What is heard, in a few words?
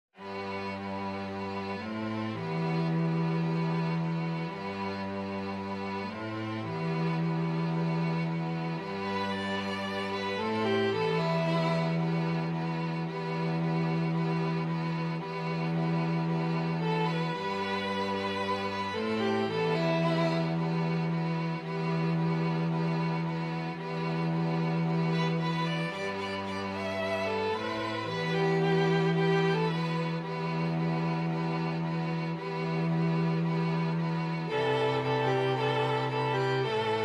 STRING QUARTET